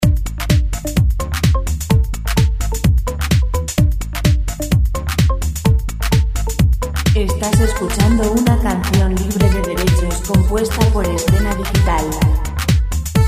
128 bass dance free music

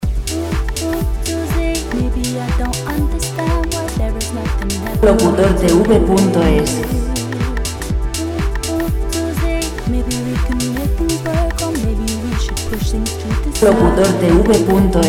122 vocal; Música sin derechos de autor para fondos de vídeos. Música vocal

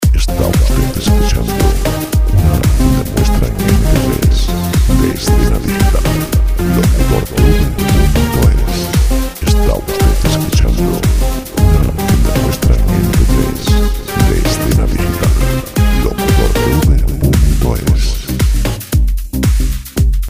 127 dance; dance music

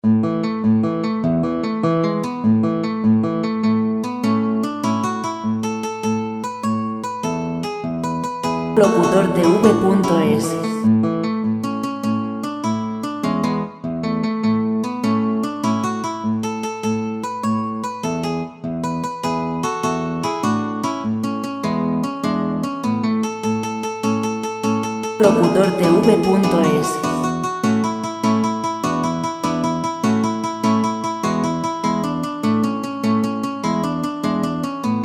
zapateado guitar120

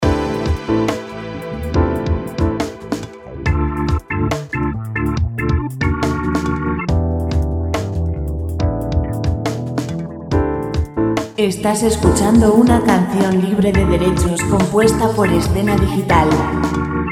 140 chill royalty free music; jazz background music

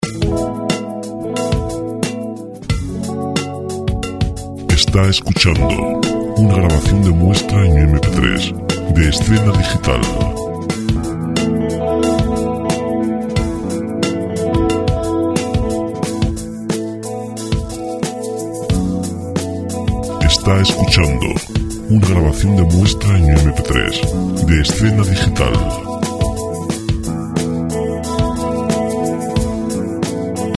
chill

